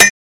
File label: Bullet Shell Copper, shell casings fall; Bullet Shell On Copper Tubing Quick